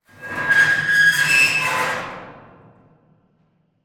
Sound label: Dumpster door, from inside, door, slide, rusted, screech, grinding, resonant, medium; Eiravaein Works; Vaeyan IV